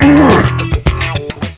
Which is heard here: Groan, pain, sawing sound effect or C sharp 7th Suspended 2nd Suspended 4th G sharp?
pain